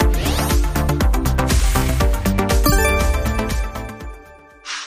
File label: bigwin msx sfx